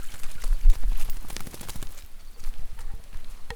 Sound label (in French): Aquatic ébrouage chien fontaine nuit; Bruitages; Eaux